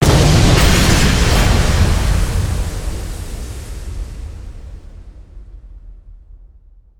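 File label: bomb explosion with shrapnel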